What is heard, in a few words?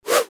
miss Sound; BattleBuddies